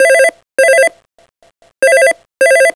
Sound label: phone